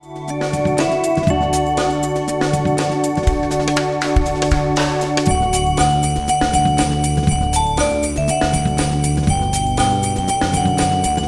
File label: jingle bells